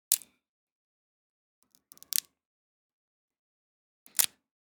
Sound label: Pencil Snap Break Wood Crack Fruit Stem Branch Twig